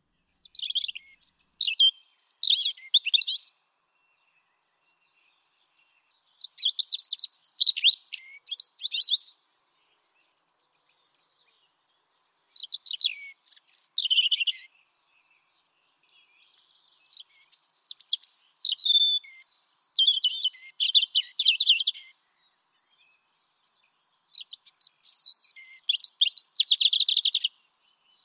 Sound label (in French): rouge gorge; oiseaux